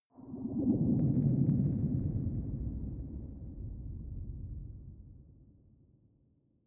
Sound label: space alien spacecraft underwater drive; raumschiff